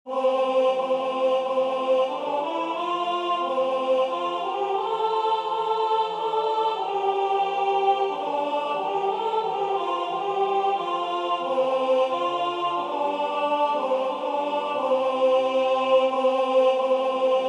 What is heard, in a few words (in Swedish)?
Ej upplysta gårdar ALT